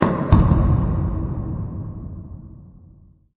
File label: mall random; random amb sfx